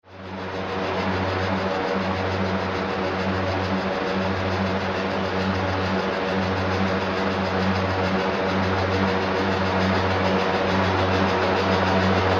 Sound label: Windmill; Gutter Muck